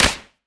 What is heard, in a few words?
Clap; Cymatics; Future Bass Starter Pack; Drums - One Shots; Heater